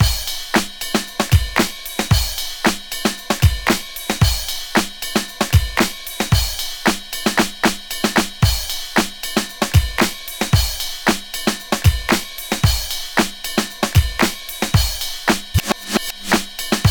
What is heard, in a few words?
114 Heavy Clap Ride, Breaks; Lockdown Breaks